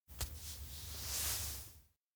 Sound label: touch cheek trace; anemoi